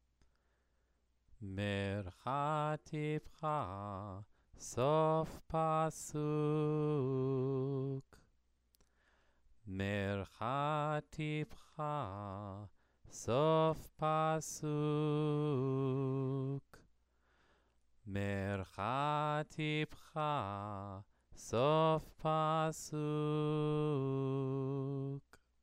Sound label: Megilat Esther exercise